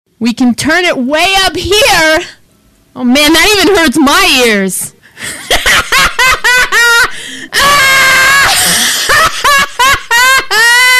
yell

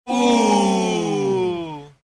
uuuuuuhhhhhh

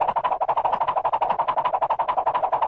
cartoon swish

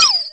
camera

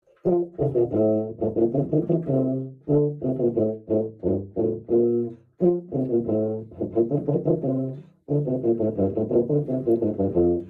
sea shanty 2 for tuba

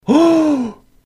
gasp

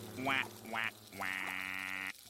whawhawhaaaa

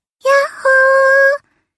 yahoo

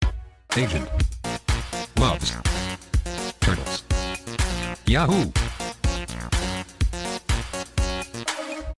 yahooooo

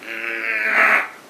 angry grunt

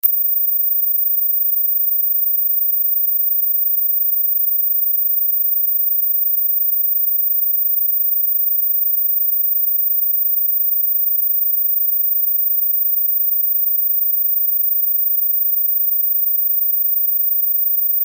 15000 hz 15 khz sine wave sound frequency tone

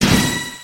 weapons pull; deltarune

